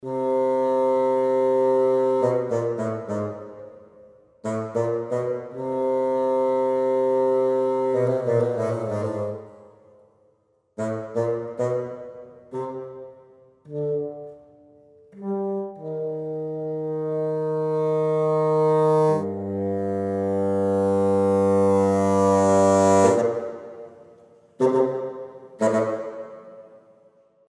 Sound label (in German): woodwind oboe solo tief